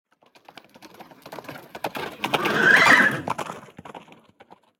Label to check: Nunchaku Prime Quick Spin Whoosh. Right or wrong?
Wrong